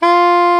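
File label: soprano hard F 65, soprano sax; Northstar - New Gold VOL-1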